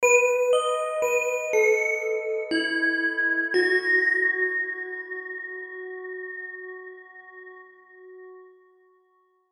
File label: Dark Church